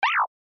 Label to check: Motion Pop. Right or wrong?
Right